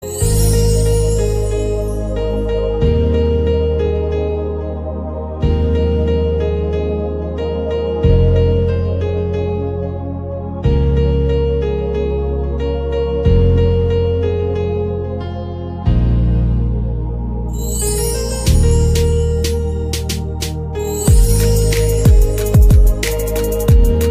marimba remix, ringetone

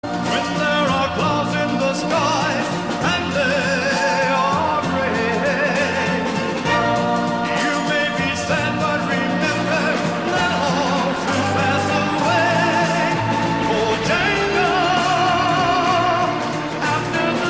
western, ringetone; mobiltelefon

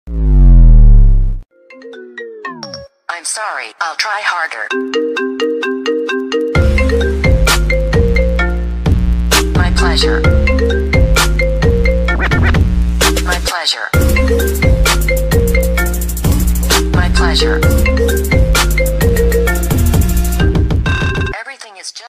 iPhone SE

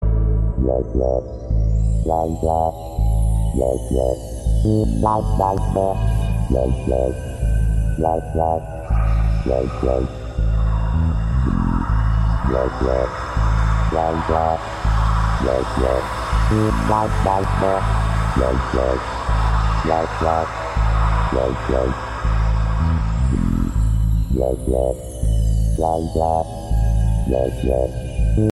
samsung galaxy note 20

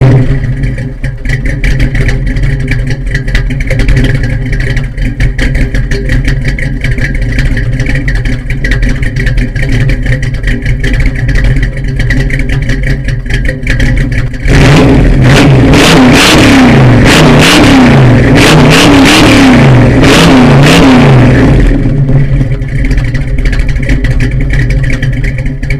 chevy

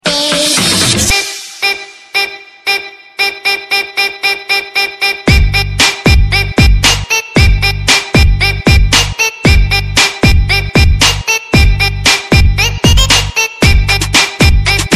trap, ringetone

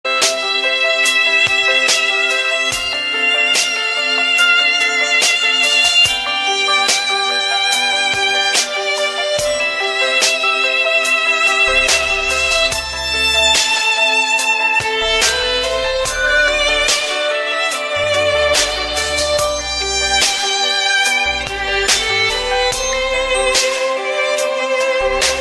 samsung ave maria

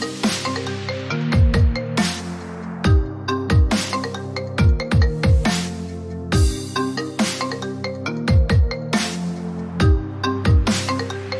iphone 14 pro max magic, ringetone

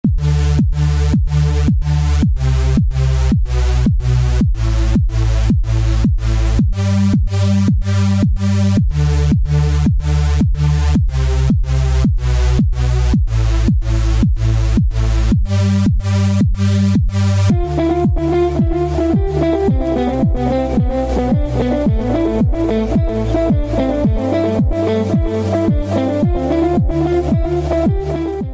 Super Remix; Samsung

